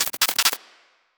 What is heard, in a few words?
a short paint shot in; bullet